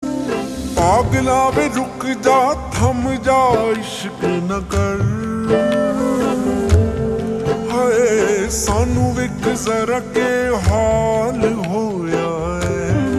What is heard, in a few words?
Ringtone slowed and reverb